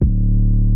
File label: Fm 808